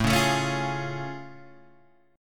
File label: A Augmented Major 7th A Guitar Open G